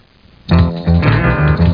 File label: blues band